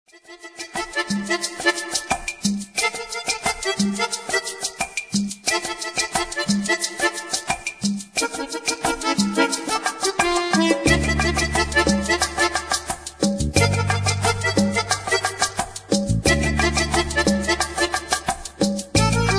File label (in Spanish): guitarra